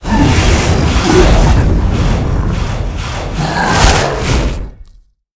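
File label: boss death, monster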